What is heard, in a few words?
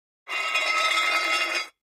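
Metal Scrape On Cement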